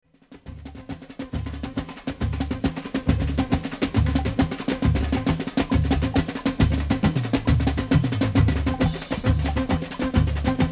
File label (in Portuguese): Batucada